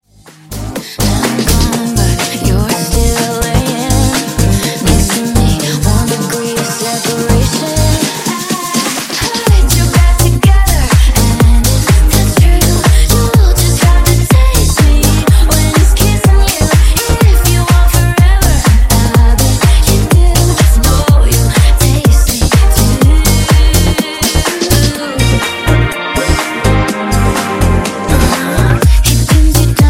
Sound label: Funk Bootleg Clean 124 Bpm